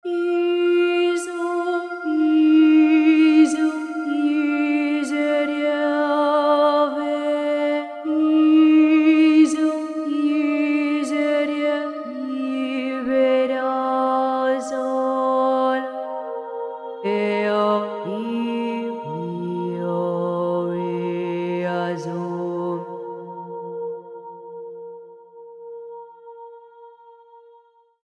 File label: Bulgarian Voice